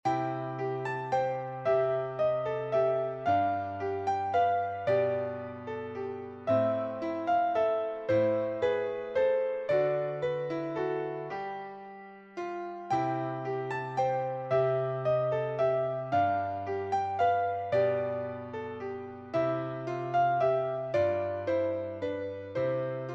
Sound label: Lullaby in C duet